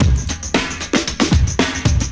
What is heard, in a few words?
break, breakbeats